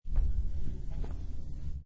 Billiard Pool Snooker Close Up Sound Of Ball Rollling On Felt